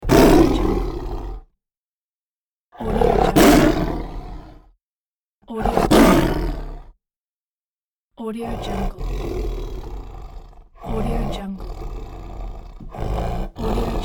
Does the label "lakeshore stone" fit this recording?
No